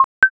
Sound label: drag